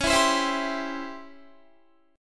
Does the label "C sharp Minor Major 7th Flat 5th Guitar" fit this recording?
Yes